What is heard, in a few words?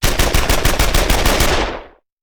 Machine Gun; Sound Ideas; Guns